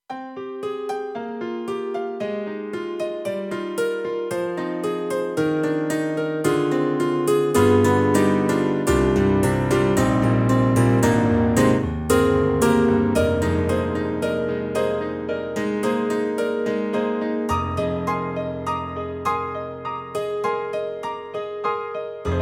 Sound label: KORG M3 Metallic Grand; M3 Version 1 Wave